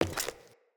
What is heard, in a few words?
Wood Step; Characters; Ambient; Sprout Farmers